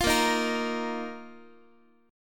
A sharp Suspended 4th A sharp down Guitar; x,x,8,8,7,9; 4A,4E,5A,5D,5G#,6C#; strummed

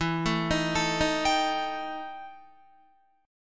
E Minor Major 7th Double Flat 5th, Guitar; arpeggiated; EmM7bb5; 12,12,13,14,15,14; 4E,4A,5D,5G,5C,6F

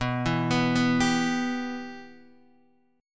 B Suspended 4th Sharp 5th, Guitar, Open D

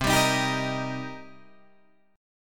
C Minor 7th C Guitar Open D

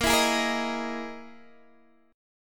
E Major 7th Flat 5th chord

